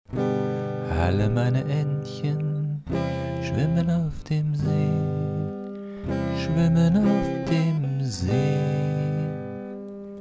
E Gitarre Voc; Austrian Audio MiCreator Studio

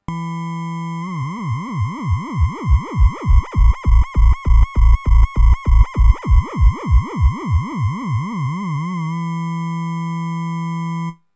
Waldorf Modular Vibrato Patch Funktionstest

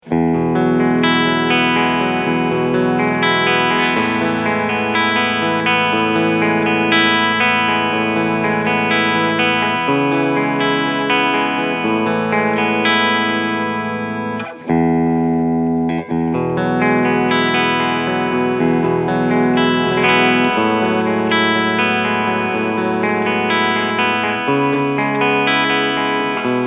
Cleansound Steg PU

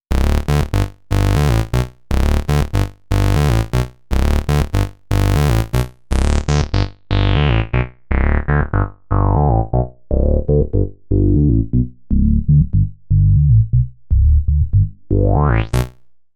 Studio Electronics Tonestar 2600 Oszillator Mix Filter Res 50 Drive 70

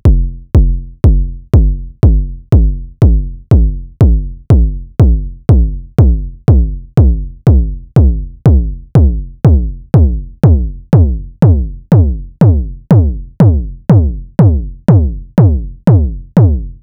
Knobula Kickain Schnelle Parameterfahrt BEND

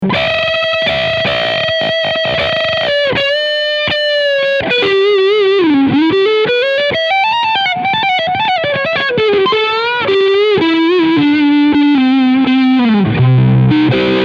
Leadsound Hals SC

